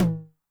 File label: HI TOM MOD Noise, 909mod; classic machines; HT - HI TOM